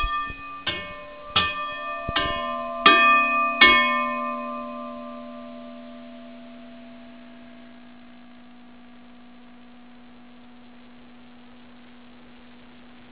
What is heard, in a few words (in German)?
stationsglocke